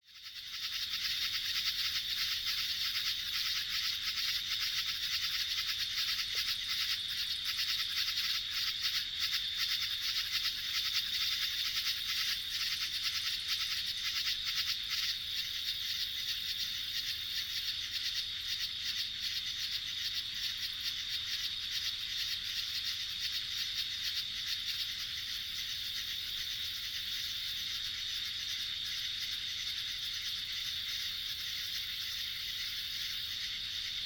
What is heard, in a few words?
true katydids